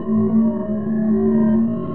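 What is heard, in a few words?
shp transfer shield loop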